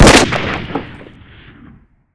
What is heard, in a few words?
sniper fire, weapons; svencoop